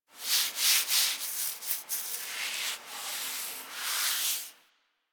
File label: foley interact scratch stand right; Enemies; Warframe; Catbrow